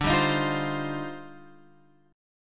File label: Eb Major 13th D, guitar